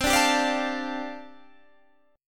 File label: G 7th Suspended 2nd Suspended 4th C chord